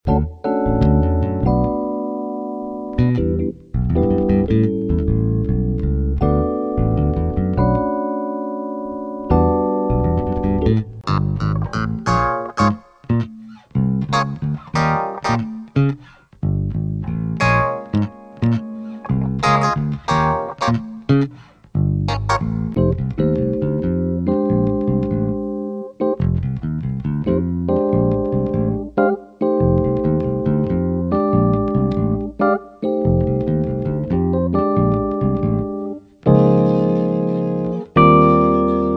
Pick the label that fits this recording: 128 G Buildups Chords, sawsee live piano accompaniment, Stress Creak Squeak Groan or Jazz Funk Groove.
Jazz Funk Groove